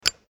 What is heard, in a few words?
lighter